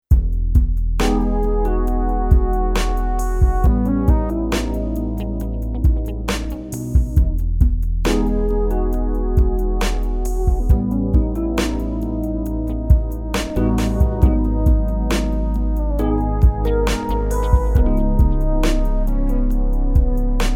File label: R&B Ballade